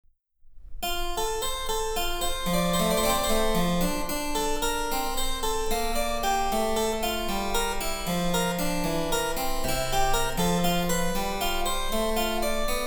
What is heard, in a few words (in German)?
Cembalo; Mark Lindley WTC 1